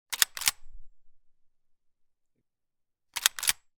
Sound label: gun model 12; still north media